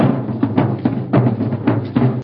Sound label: Drums